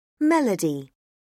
melody; Oxford Word Skills Intermediate

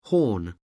horn; Oxford Word Skills Intermediate

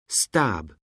stab; Oxford Word Skills Intermediate

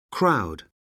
crowd; Oxford Word Skills Intermediate

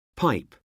pipe; Oxford Word Skills Intermediate

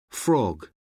frog; Oxford Word Skills Intermediate

